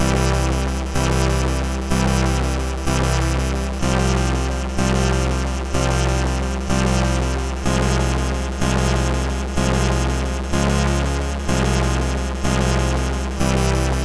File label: Good Blark, MOS6581R3